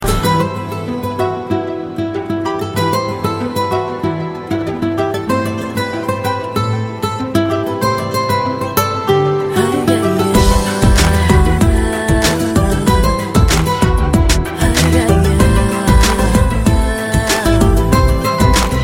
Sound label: Tune